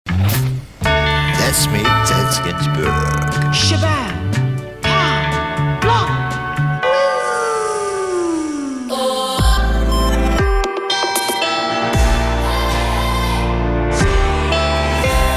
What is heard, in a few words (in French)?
Carillon; Habillage